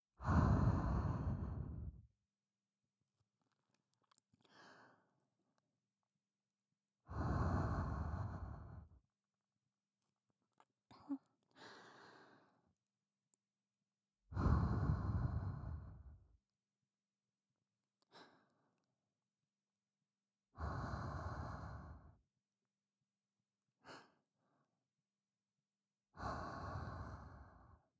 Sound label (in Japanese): 吐息